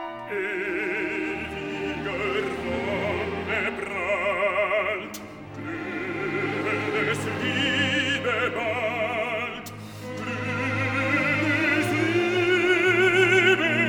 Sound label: Symphony No.8 in E flat major; Mahler; Decca; II ii. Ewiger Wonnebrand